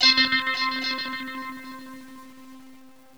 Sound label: twang